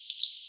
song

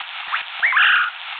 whit weeoo, Empidonax traillii; Passeriformes; Tyrannidae

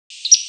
song

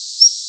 song

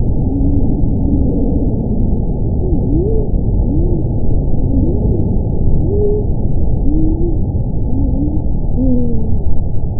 rare call, Empidonax alnorum; denali; Passeriformes; Tyrannidae

